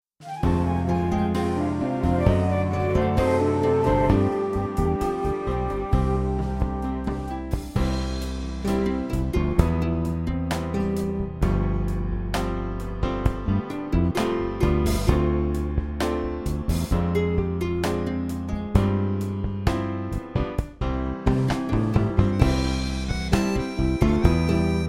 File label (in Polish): wersja instrumentalna